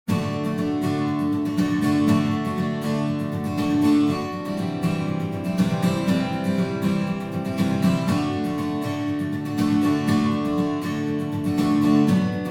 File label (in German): Akustik Gitarre Reverb; TSAR